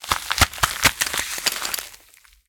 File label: frozen; Scrat